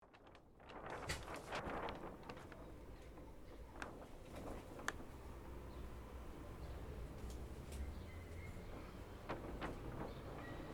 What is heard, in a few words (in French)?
vent papier